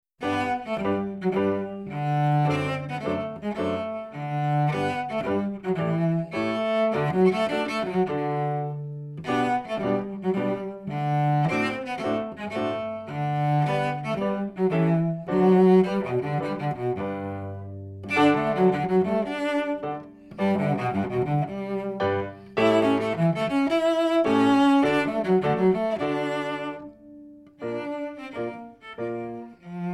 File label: Theme From 'Witches Dance', cello; Suzuki Cello Vol 2